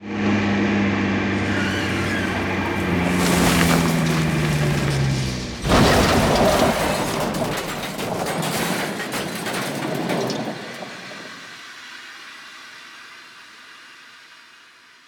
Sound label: Car Crash Sequence; The 39 Steps